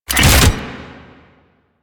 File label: Reload, Rifle; Weapons; Warframe; Thanotech; Trumna Prime